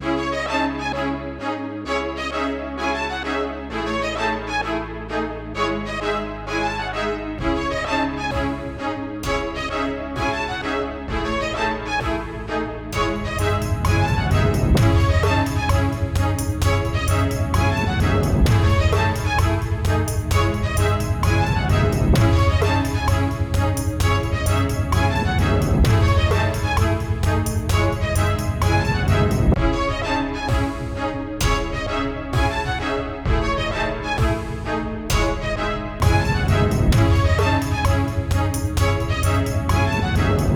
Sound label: Cinematic 130 BPM Dbmin